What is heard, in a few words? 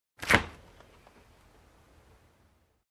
Icebox opening